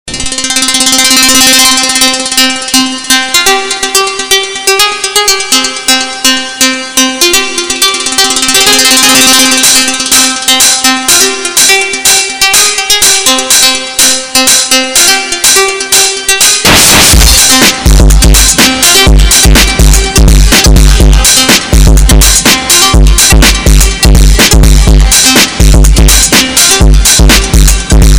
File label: mega bass, ringtones